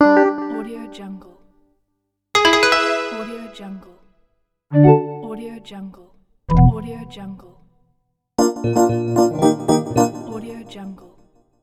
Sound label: software fx sound pack